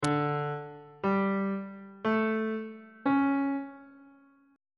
c double sharp major 7th suspended 4th chord intervals